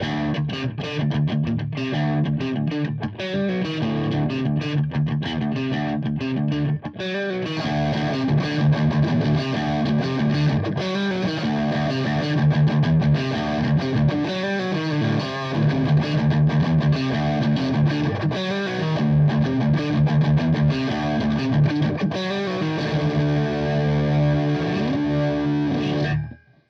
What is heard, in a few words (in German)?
Verzerrer Pedale Gain Channel Sweet Spot